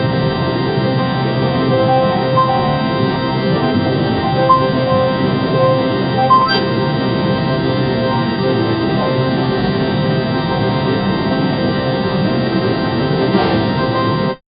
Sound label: casino slot world record